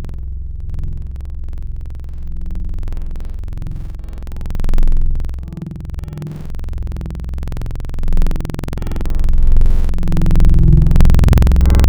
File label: Moombahton; Synthetic's Serum Collection